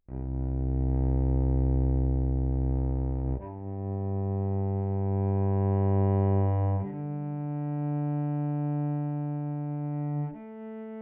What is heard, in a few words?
Redeye Twin Treble full off